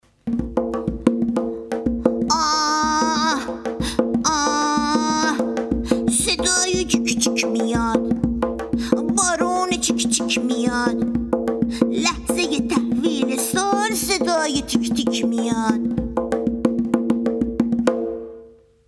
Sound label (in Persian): سال نو